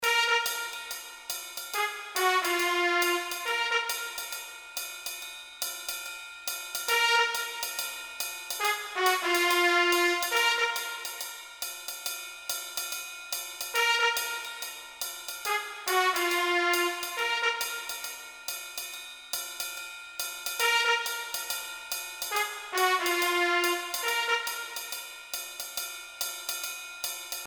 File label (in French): orchestre trompette